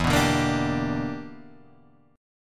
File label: E Minor 7th Sharp 11th E; strummed